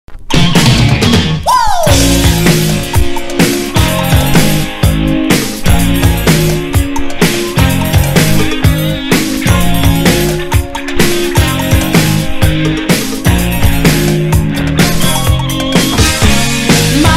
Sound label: Funk